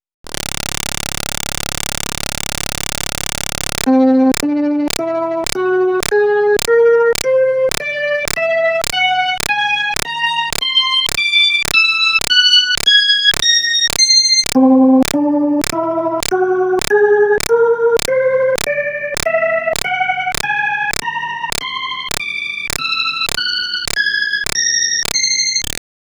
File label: B3 Organ; Masterbits CLIMAX 8